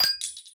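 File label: Bullet shell Shell Eject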